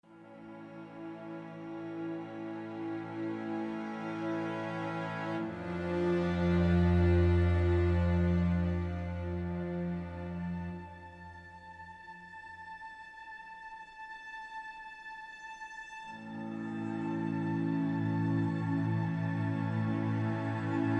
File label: Explore Day Acoustic; Horizon Zero Dawn; PS4